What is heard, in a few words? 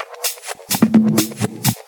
VFX2 Loop 128 BPM